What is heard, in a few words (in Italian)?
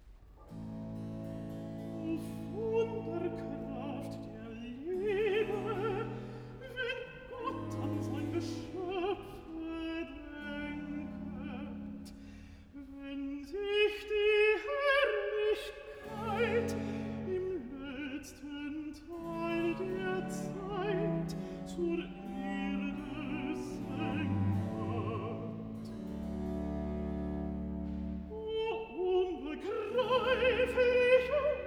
Cantata, Recitativo; BWV 96 'Herr Christ, der ein'ge Gottessohn'; II. Recitativo 'O Wunderkraft der Liebe'; Bach; Leipzig; 18th Sun After Trinity